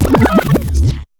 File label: TAPE STOP